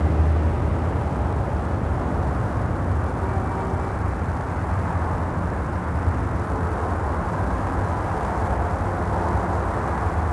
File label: Elevated City Ambience; noise; Max Payne; XBOX